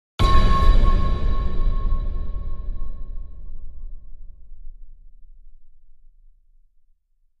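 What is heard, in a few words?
Impact Metallic Clang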